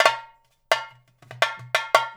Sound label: fill, percussion